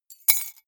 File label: key ring large drop stone